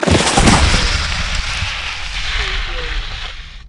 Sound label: Skiing, Snowboarding, Landing after jump, snow slide